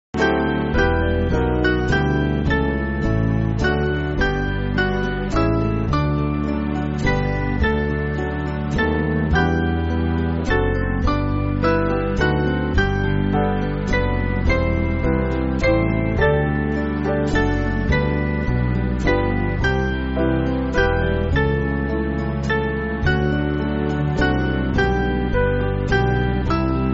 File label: Band, church music; O Jesus So Sweet; O Jesulein Suss